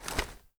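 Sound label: gear rattle weapon large; viper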